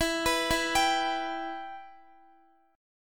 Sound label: E Minor E down Guitar; arpeggiated